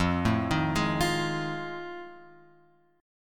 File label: F 7th Sharp 9th; F7#9 Chord {1 0 1 1 X 1} arpeggiated